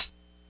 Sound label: Hat One Shot D Key, hat sample tuned to the D note. Loudest frequency: 10489Hz; drum single hits one shots; hat samples